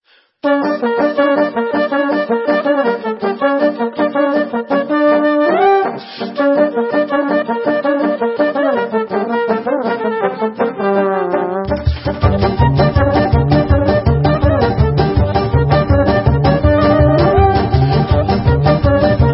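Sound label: Instrumental, Israeli Dances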